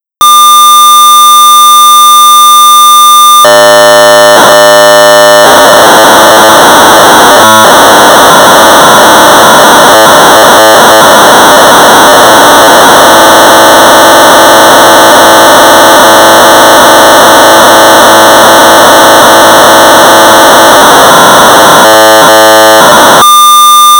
Sorcerer 1200 baud; HiRes Drawing; 1982; Practical Computing; BASIC

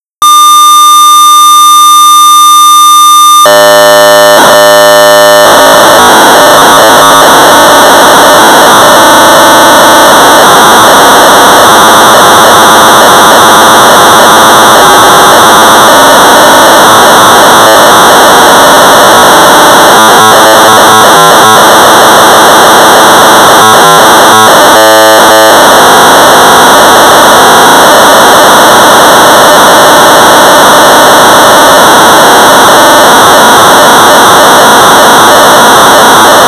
Sorcerer 1200 baud; The Sorcerers Fruit Machine; David Winton; BASIC

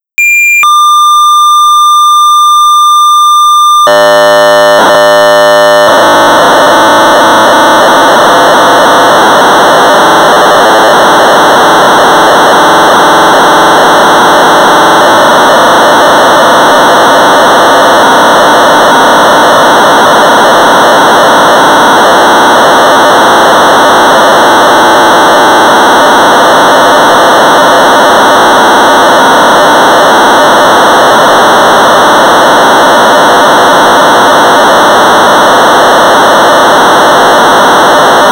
BASIC Sorcerer 1200baud; Checkers; Creative Computing

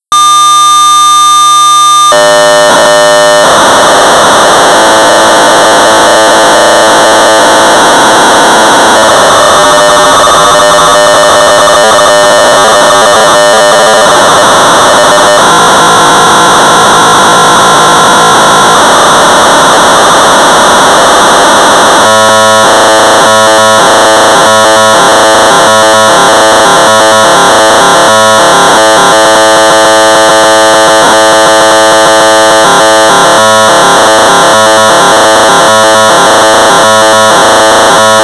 Sorcerer 1200 baud; Head-on-Collision; non-joystick-version; L. Andres; Quality Software

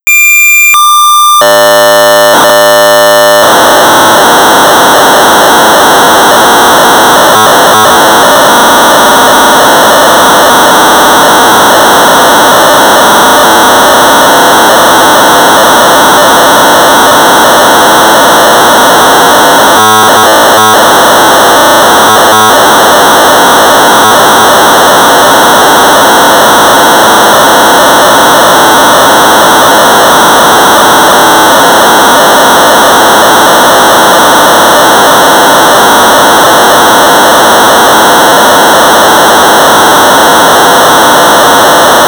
Sorcerer 1200 baud; Star Trek; H.B.Allen; BASIC

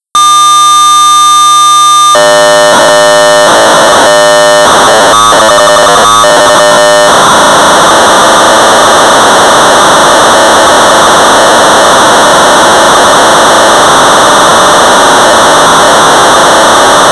Sorcerer 1200 baud; Sargon Chess; Sorcerer Users Group

